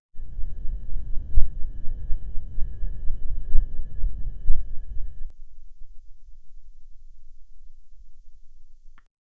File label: arm lifted by external; eerie